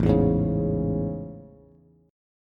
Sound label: A Major 7th A, Bass; AM7; strummed; JGuitar